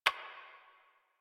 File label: Computer Keyboard Stroke; Warframe; NineteenNinetyNine; Messenger